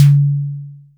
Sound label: SD decay; Sonic-Core EDS16-V2